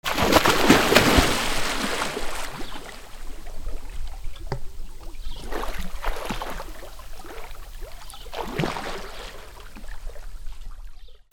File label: WATER